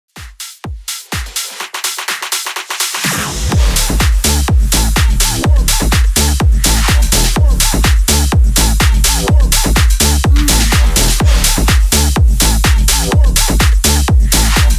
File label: 125 BPM